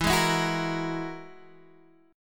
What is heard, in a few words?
G sharp 7th Suspended 2nd Sharp 5th